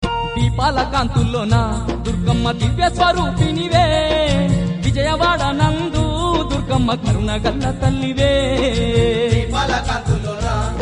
Deepala Kanthullona Durgamma Telugu Bhakti Song; Devotional

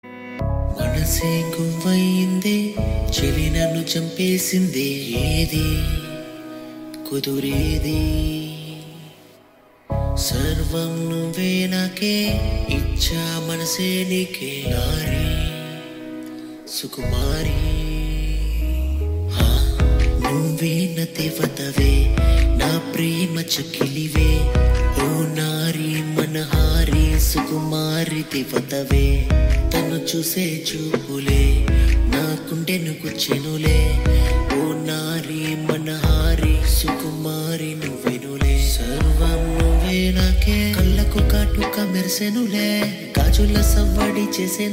Telugu Full Song

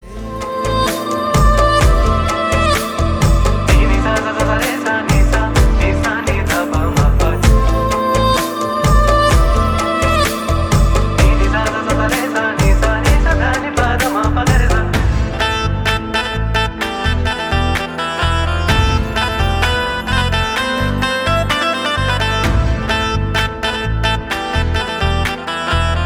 Lehja Instrumental Ringtone

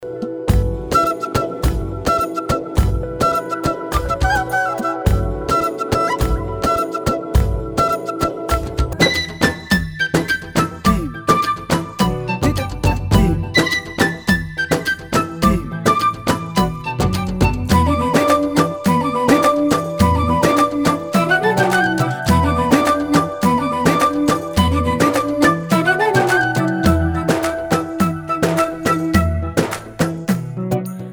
Flute Music; Seethamma Vakitlo Sirimalle Chettu; Telugu

